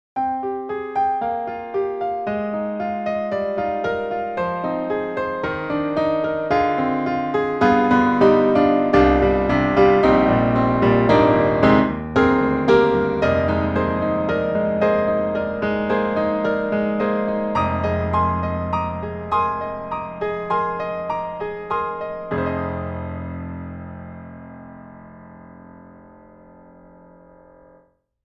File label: Grand Pianos, Bosendorfer, Bosendorfer Imperial Dry; Synthogy; IvoryII